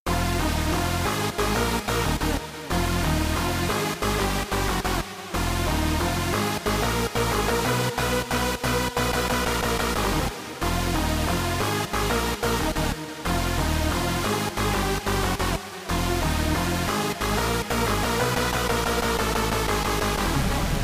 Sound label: melody